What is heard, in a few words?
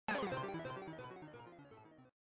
low battery complete done